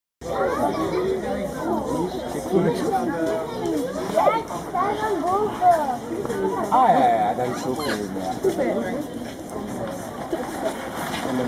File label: cyanopsitta spixii